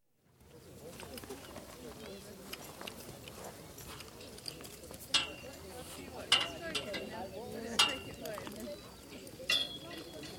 Polo, horselines during match, clinks of horses tied to metal rails, distant PA, some chat, horses galloping, distant traffic; Horse Sound Effects; BBC